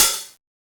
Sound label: Hat